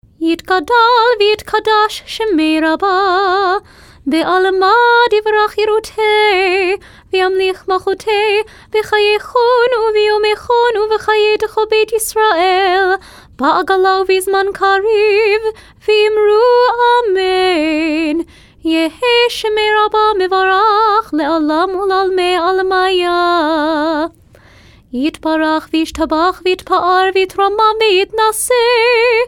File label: kaddish shalem weekday minor